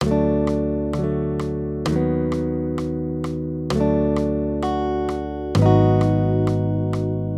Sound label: maj m3, Major Triads, Root Movement Three Semitones, Guitar, Accompaniment; D-B